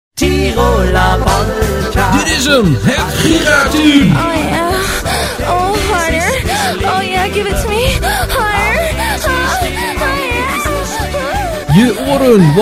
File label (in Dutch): Jingle, hijgversie; Audio Fragmenten